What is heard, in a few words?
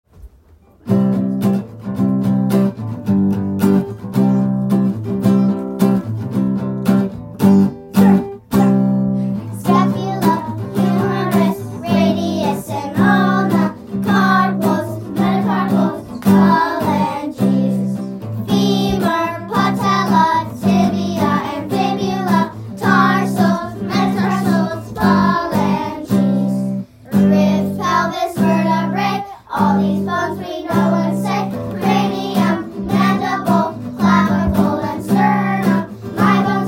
Bones Guitar